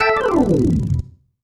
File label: Slide, Organ, Loops; Sonic Foundry (Sony Creative Software); Joe Vitale Organ Donor; Slides